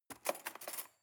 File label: cutlery cake fork drawer grab